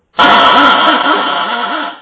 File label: klaxon; jean-pierre beaufays